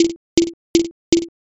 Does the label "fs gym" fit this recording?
Yes